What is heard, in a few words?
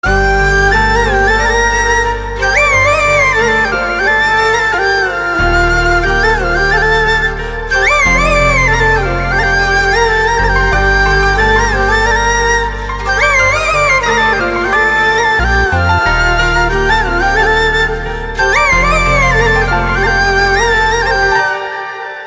Instrumental Ringtone